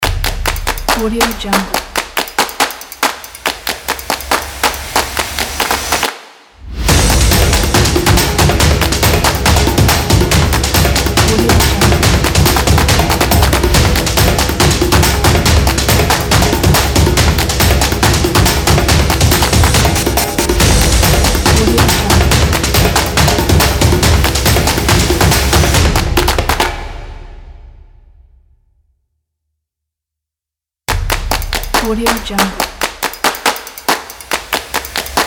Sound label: epic sport drums logo